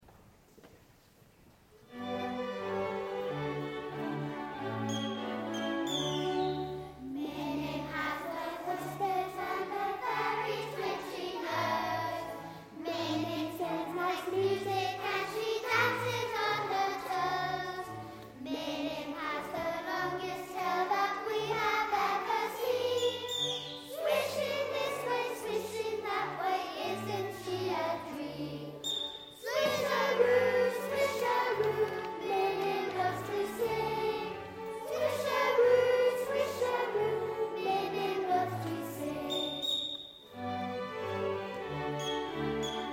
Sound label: Infant Choir